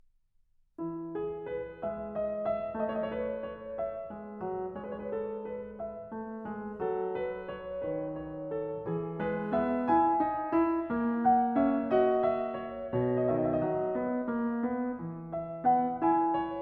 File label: Prelude No. 9 in E major, Well-Tempered Clavier; BWV 854; Bach; Book 1